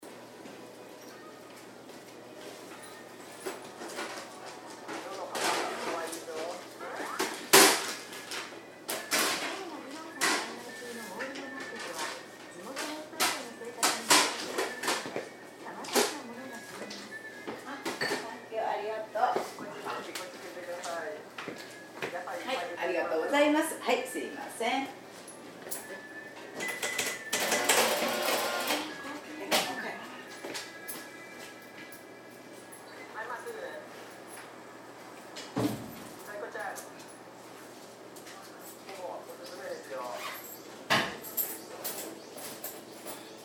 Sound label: Merchant Female Register Tokyo Japan; Small shop, merchant in Tokyo, Japan. Female shop assistant; Stores And Mall Sound Effects